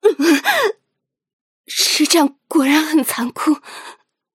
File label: break